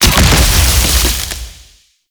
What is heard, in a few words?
Grenade Explosion; Warframe; Lasrian Soldiers; Weapons; Lasrian Goonadier Grenade